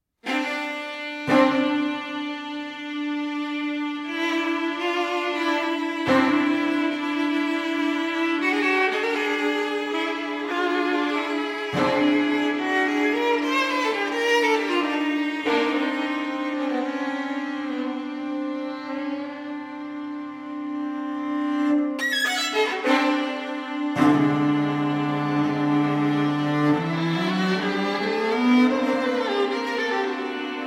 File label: string quartet drammatico